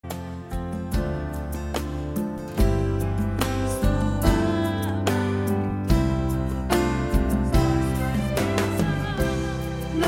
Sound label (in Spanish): Su Amor Cristo Me Ama Contraltos; cantata navidena